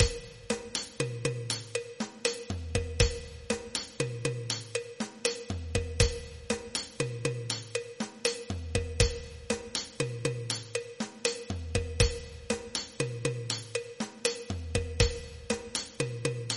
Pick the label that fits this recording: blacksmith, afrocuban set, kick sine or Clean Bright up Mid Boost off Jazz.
afrocuban set